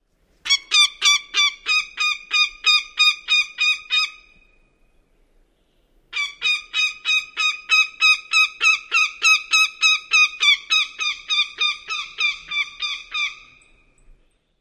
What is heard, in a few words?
alarm calls; NY